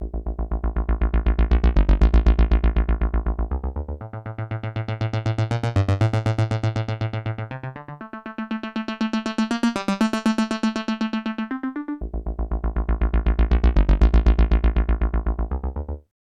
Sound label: synth